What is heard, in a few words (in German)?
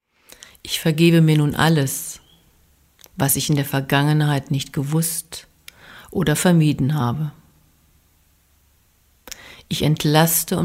Segens Vergebensmeditation; Faehrtoene